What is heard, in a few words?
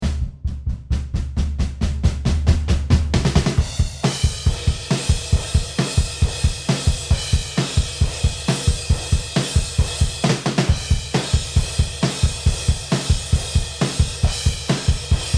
Drum Sample